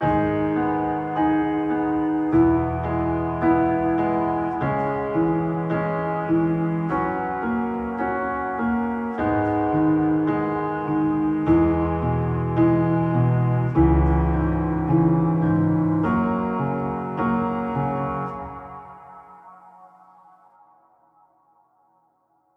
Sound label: Piano 105 G-min Tail